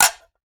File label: Plastic Plate; 1001 Sound Effects